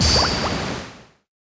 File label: Water bullet; The Denpa Men; Android